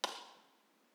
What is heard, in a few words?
IR clappatore; Teatro Benevento; B-format